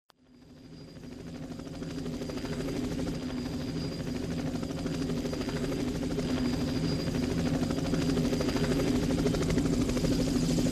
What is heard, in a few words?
Helicopter with vocals